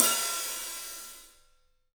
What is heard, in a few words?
Paiste hat, drums; 3D Sound